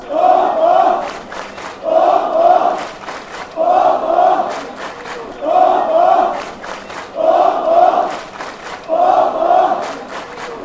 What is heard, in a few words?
oh oh, fanchants